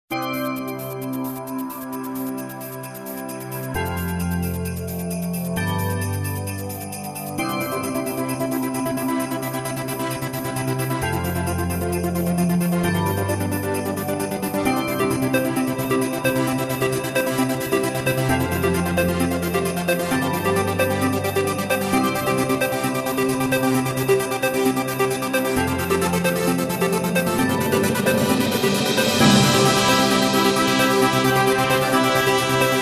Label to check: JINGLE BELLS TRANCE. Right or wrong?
Right